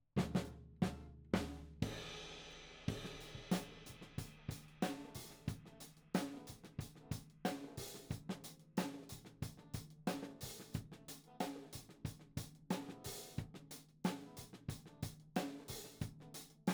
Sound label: Tom1